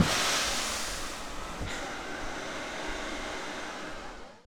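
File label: hydraulics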